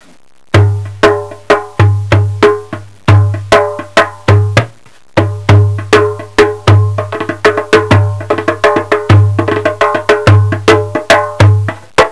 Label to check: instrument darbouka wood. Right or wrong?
Right